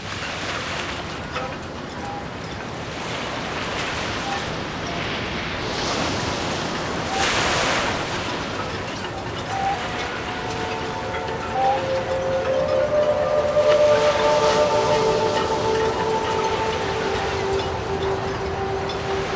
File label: Harbour; Ladianes